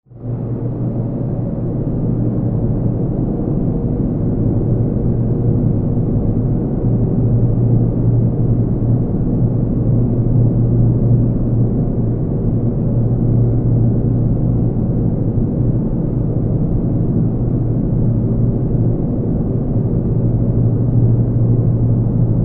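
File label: Rumble in a metro tunnel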